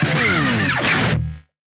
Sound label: start; Frets on Fire